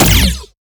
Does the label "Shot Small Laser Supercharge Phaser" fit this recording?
Yes